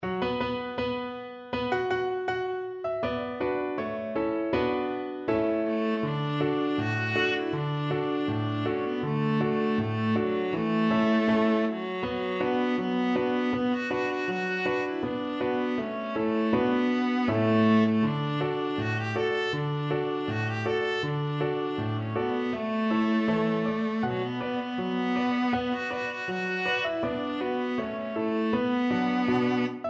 viola

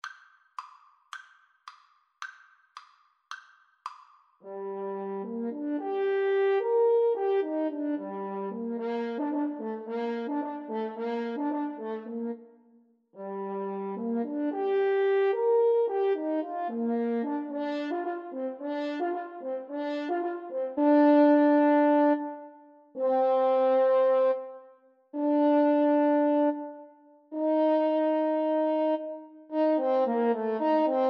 French Horn Duet; Dvorak From the New World

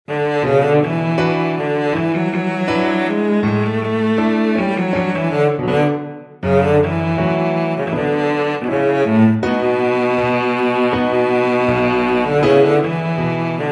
bartok romanian folkdance, cello

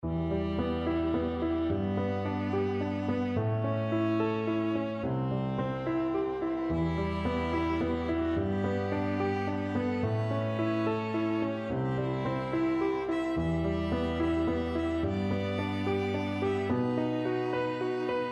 House of the rising sin piano quartet

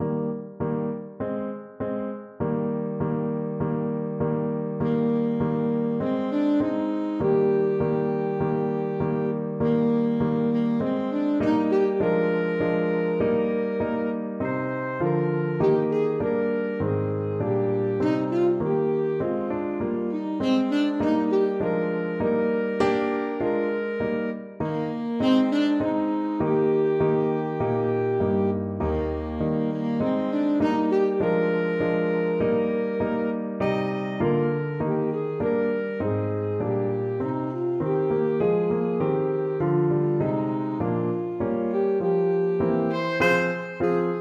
saxophone

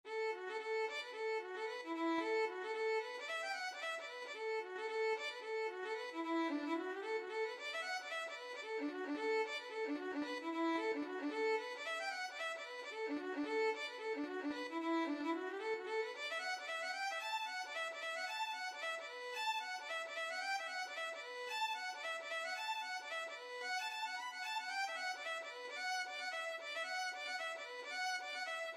oranmore violin

